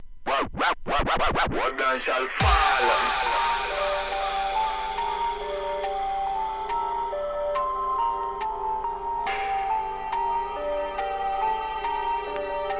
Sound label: mix dubstep